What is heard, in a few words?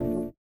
VIBRA; Spectrasonics; Metamorphosis